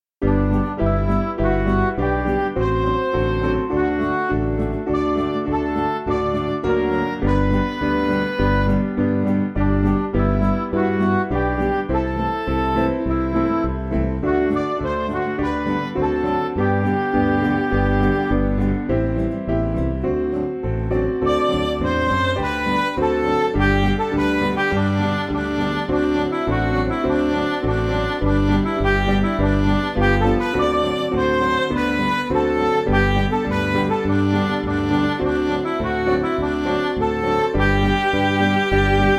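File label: Music Backing Files for Churches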